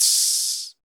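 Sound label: vox percussion, Vocal Planet Jazz FX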